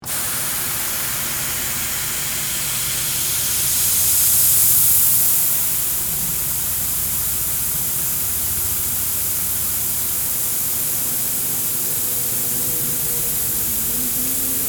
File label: Laser Cutter, Industrial, Cutting, Machine, On, Run, Off; Machinery; BlastWave